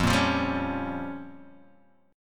A sharp Minor 6th

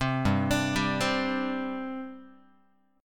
G Suspended 4th G down Guitar; arpeggiated; 3,3,5,5,4,x; 4A,4E,5A,5D,5G#,6C#; JGuitar

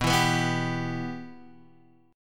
B Suspended 4th Sharp 5th, Guitar, Open D

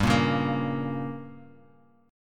G Diminished, Bass Standard; strummed; JGuitar

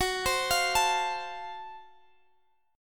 F sharp Minor Major 7th Flat 5th, guitar; chordshape; down

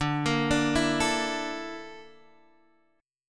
Bb Major 7th Flat 5th

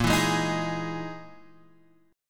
E Major 7th Flat 5th chord

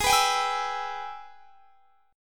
Ab Minor Major 7th Sharp 5th, down; strummed; AbmM7#5

